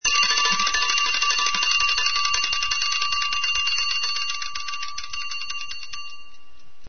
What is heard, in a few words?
CLOCK